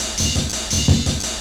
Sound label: Filler 170, Breaks; EZRollers